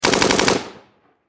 Warfare Machine Gun 7.62 Caliber Bren Lmg Long Burst Distant Perspective